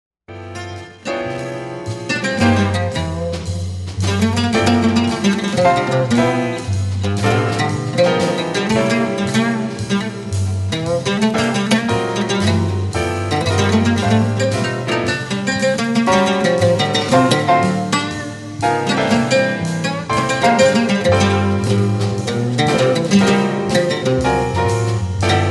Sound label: Oud